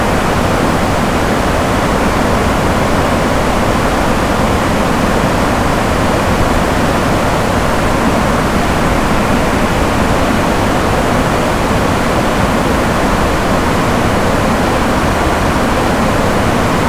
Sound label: Falls Noise Loop